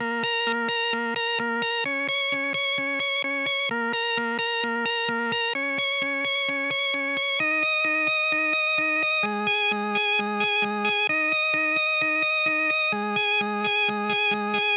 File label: Organ; Synths and Organ